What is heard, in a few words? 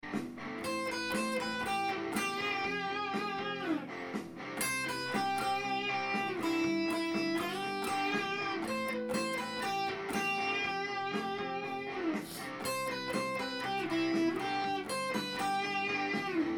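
slow jazz, guitar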